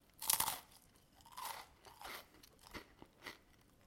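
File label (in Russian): поедание жевание снека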